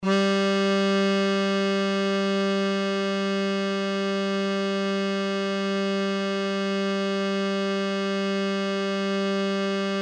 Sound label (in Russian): 3 struna, struni gitari